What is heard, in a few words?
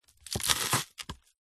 spring onion single chop with knife